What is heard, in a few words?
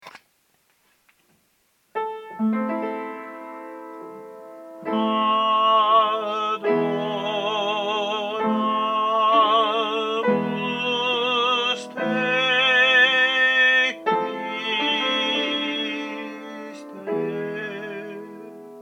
adoramus te soprane; palestrina